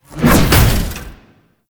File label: Necramech Ranged Spin Attack Swing; Warframe